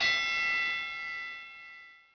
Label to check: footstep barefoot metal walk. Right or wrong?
Wrong